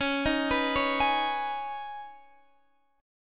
C sharp 7th Suspended 2nd C sharp Guitar Half Step Down